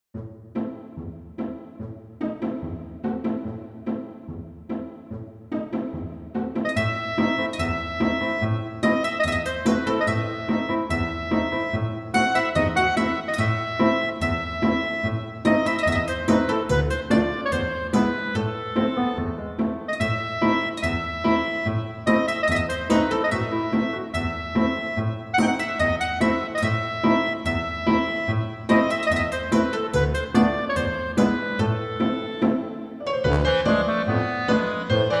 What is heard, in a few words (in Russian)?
Evrejskaya muzyka